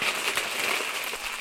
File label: paper crumple